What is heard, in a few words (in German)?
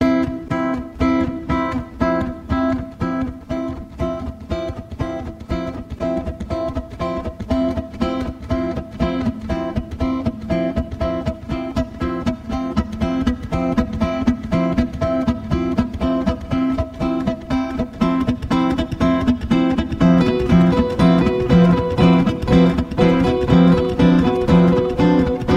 gitarr; 50er